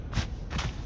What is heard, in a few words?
roll; kena bridge of spirits